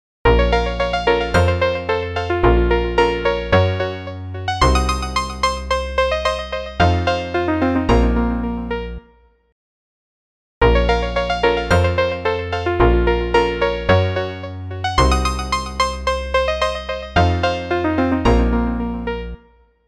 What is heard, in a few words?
gameover; DungeonUp